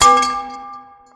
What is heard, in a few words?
Bowl Hitting Ground; Feed The Beast